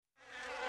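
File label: swarm of buzzing flies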